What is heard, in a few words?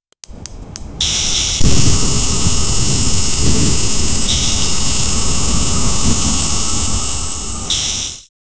sparkling destroyed robot